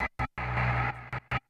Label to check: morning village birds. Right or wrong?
Wrong